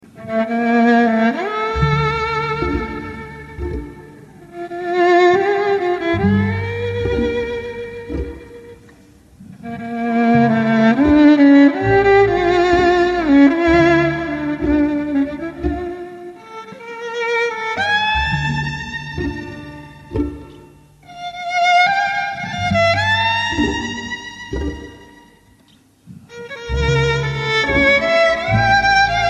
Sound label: beautiful violin ringtone